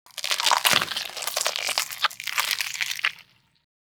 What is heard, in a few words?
body gore